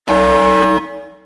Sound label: alarm